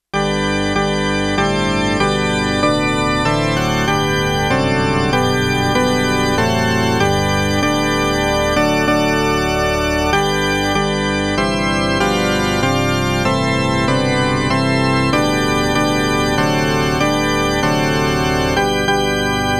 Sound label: Joyful joyful we adore thee